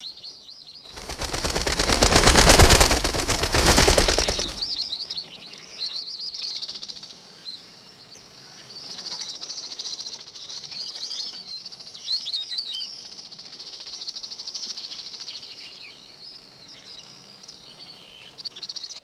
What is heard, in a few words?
birds flapping wings with faint chirps